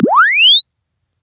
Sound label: Press Two Sides Chirp; Samsung 4 Recordings